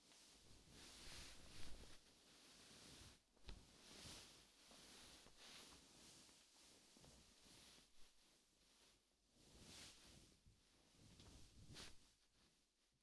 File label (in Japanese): 布団 を もぞもぞ; 3DIO; 布団の音